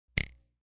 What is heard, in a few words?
Click; Clicks